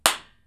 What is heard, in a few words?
clap rir